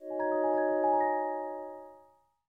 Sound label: break start